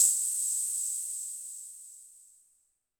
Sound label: HiHat & Crash, Roland TD-20; HiHats